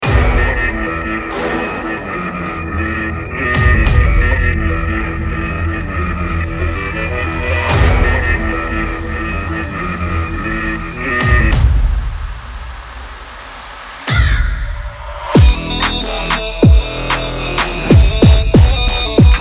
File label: Arabic Mafia Beats